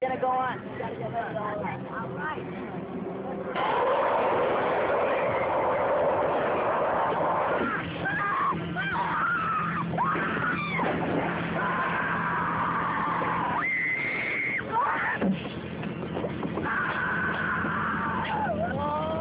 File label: burning splatter